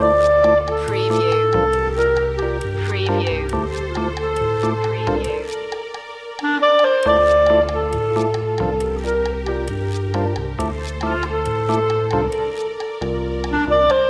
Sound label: loop